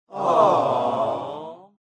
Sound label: Crowd aww